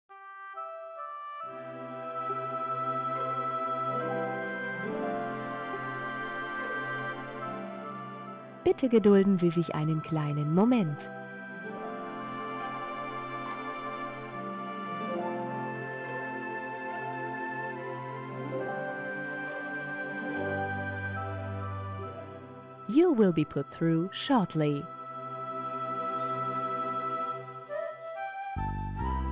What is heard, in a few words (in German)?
Melodie Modern